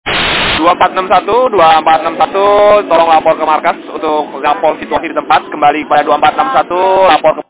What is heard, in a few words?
police indonesia